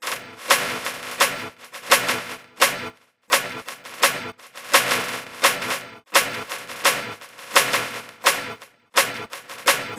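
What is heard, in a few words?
Polyfonic signal separated; Separation of Sound Sources by Convolutive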